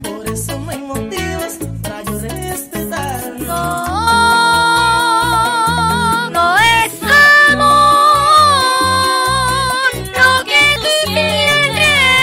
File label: singing in spanish; vflower